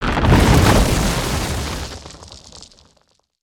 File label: man eater spawn; weapons; aronwk collection; ndaudio